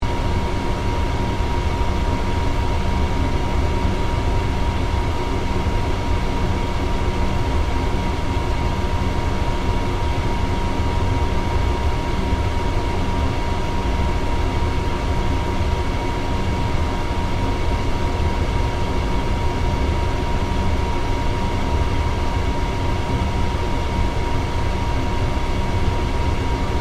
Engineering Room, Industry, Industrial, Engineering, Room, Ambience, Loop; Factory